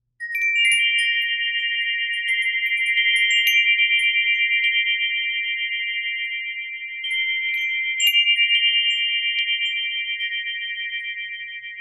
Chimes of Mercury Silver; Encore

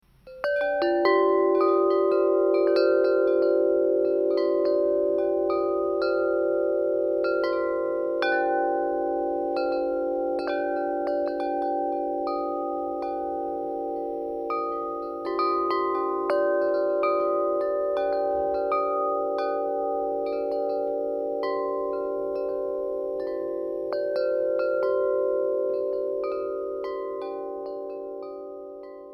Chimes of Neptune Bronze

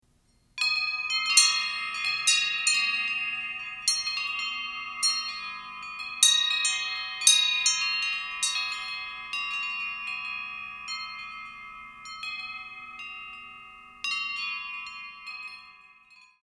Chimes of Saturn Bronze

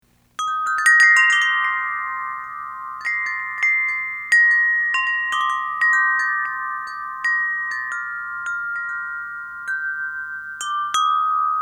Chimes Alto Silver; Gregorian

